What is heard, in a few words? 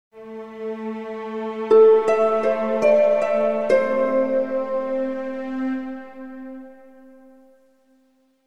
harp positive stinger; logo stingers